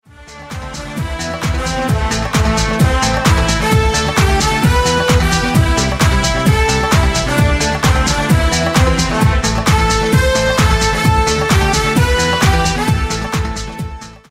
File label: SAMBA